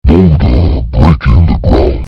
BOULDER BREAKING THE GROUND

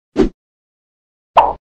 swish swoosh

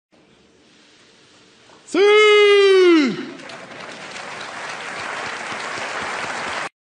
scream in celebration; cristiano ronaldo; in celebration of ballon dor 2014

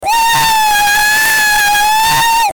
Crazy Crying; Crazy Crying meme

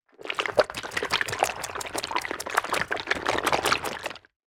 household hot water bottle shake water movements; zapsplat

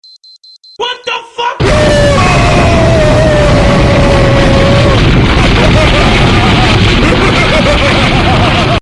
wtf boooom

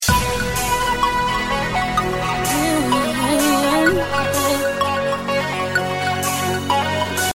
SUPER BASS INTRO

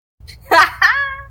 Ha Ha

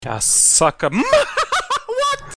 mahahahaha

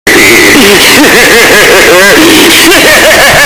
laugh earrape; plankton

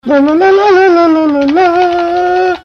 singing

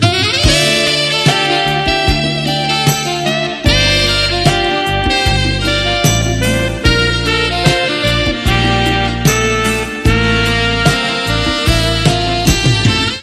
sexy sax

